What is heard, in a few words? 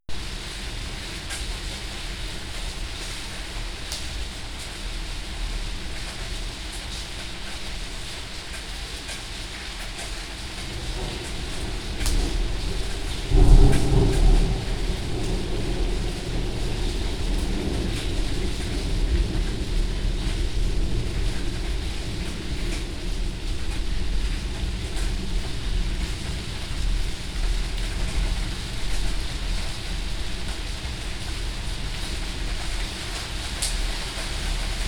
rain loop